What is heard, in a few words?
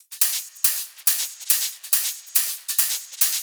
drum Loop 140BPM no Kick no clap; Vengeance - Essential Clubsounds Vol.5; VEC5 Drumloops; VEC5 Multi Loops 140BPM